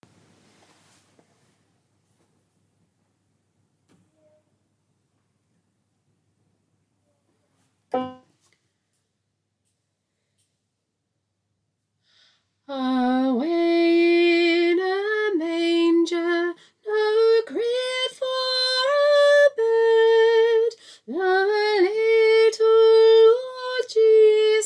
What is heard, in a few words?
soprano, choir; Christmas